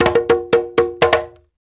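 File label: break drum, Reggae; Spectrasonics - Supreme Beats - World Dance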